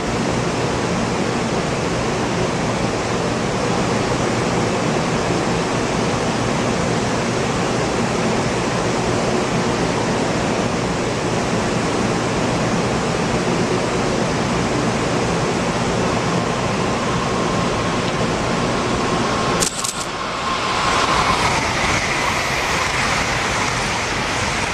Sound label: shinkansen series0 odawara; panorama